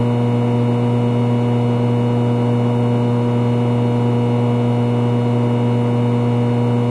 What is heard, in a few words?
neon hum